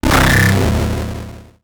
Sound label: Transition Blast Impact; Production Element Title Transition